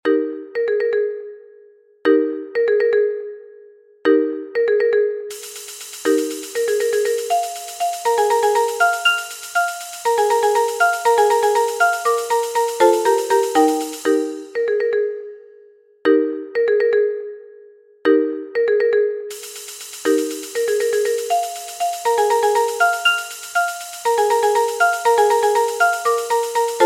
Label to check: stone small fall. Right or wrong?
Wrong